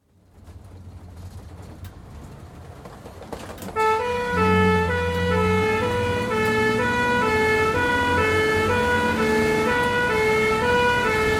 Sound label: Ambulance, start into constant run with two-tone horn siren at speed through heavy traffic, various acoustic changes; Emergency Vehicle Sound Effects; BBC